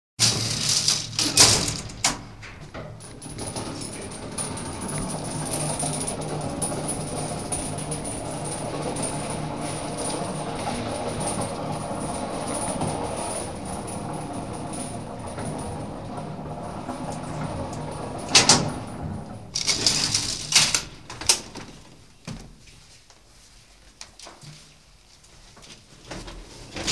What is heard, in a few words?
Freight elevator sequence; SOUND EFFECTS1